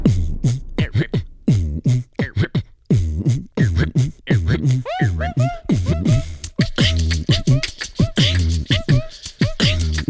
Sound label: Beatbox Samba